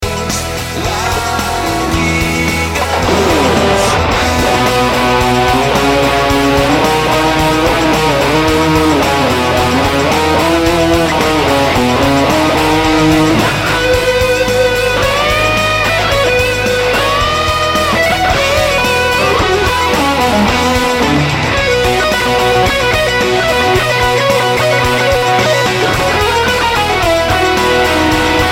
solo

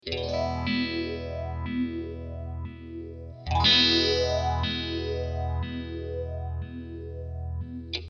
axe saw

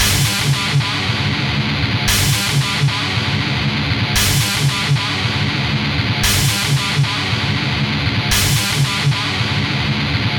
Mesa Triple Recto

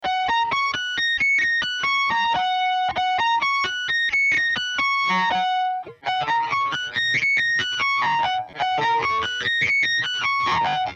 whammy test

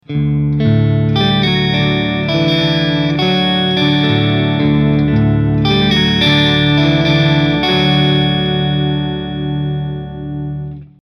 poly oct

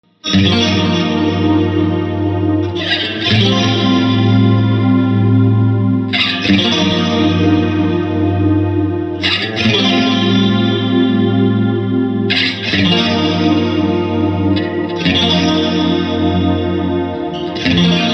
80s chorus

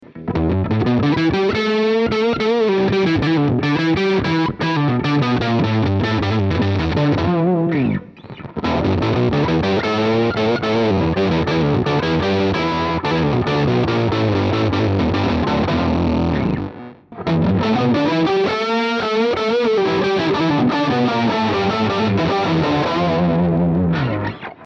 octave latency

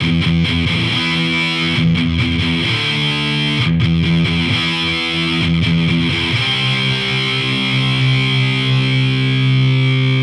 D112

